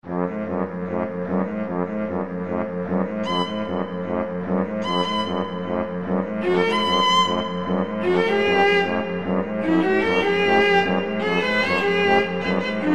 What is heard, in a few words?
only music; like a student film